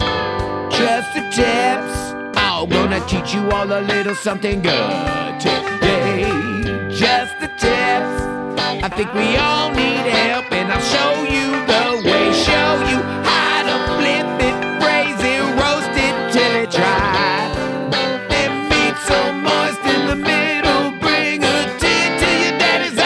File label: Funk Horns